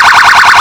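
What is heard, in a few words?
emergency vehicle phaser, sirens; west mids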